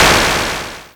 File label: snr; Stereo